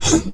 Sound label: jump; skelebot